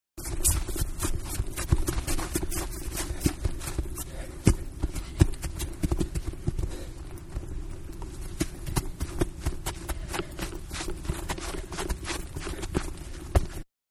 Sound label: Soccer Running down field, Soccer-Running Downfield Ball Is Fairly Silent; Manhattan Production Music